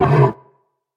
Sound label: monster roar short; soundscrate